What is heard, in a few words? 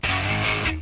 Guitar